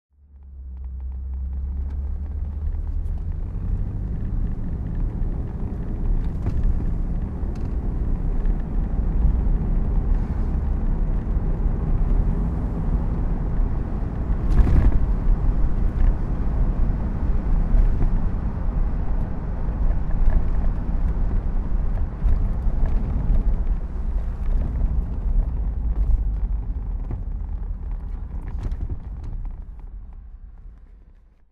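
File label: bentley convertible driving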